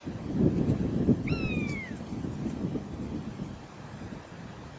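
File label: common buzzard; ullapool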